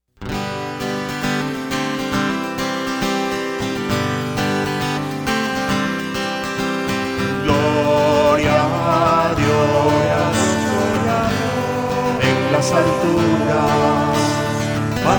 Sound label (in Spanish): misa canto gloria